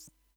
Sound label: zap; ur-quan